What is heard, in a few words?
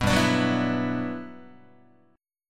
G Major 13th, Guitar; strummed; JGuitar